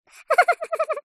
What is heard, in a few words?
cartoon character cute high pitched giggle laugh short